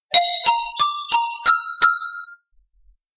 Uh Oh

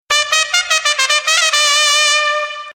Stadium Dj Sms; Ipl

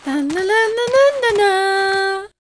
Female Nanana

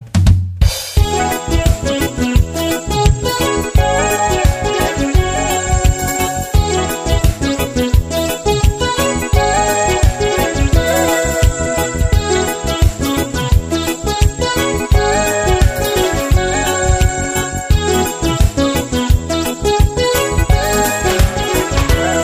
Eritrea classic

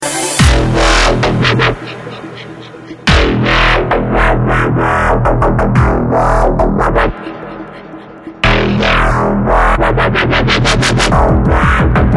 Drumm Bass

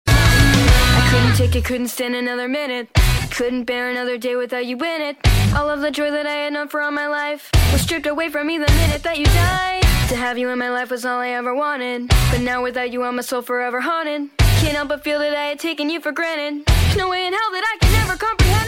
Cute Ringtone

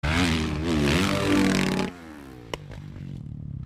Yz450 whip

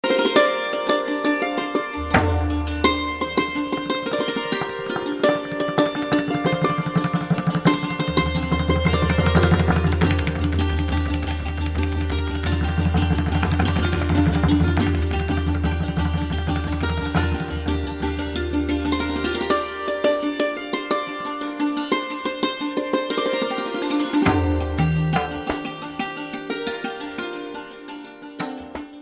Santoor

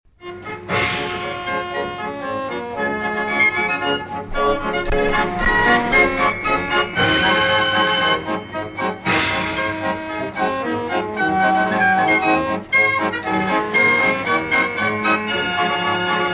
Barrel-organ

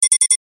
notification

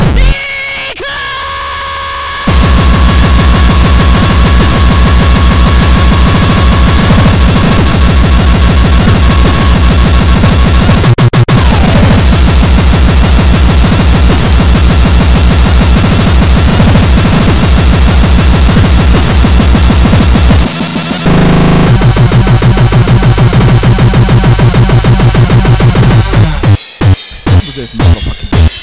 Speedcore

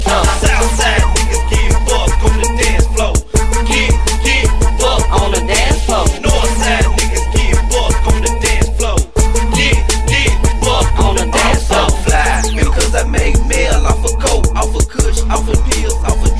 Crunk

